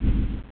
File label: Aew1; Evolutionary War 1.0